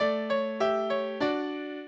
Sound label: minuet, mozart